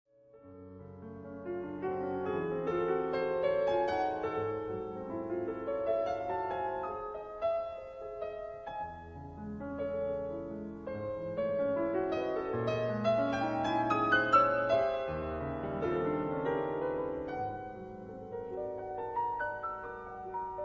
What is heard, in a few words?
Romantic Piano Concerto; Joseph Marx